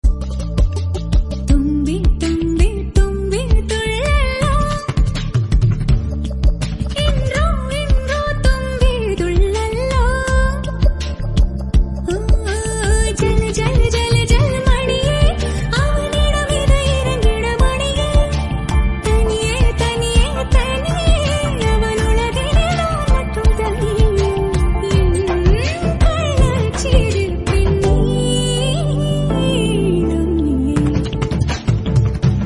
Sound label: Tamil Ringtone; Cobra